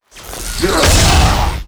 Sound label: scholar ghost atk; monster